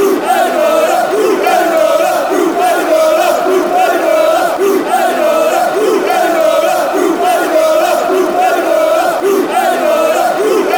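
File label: football chants; dele adebola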